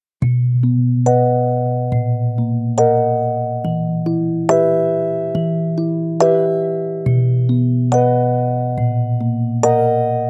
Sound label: Music Box; 감성 BG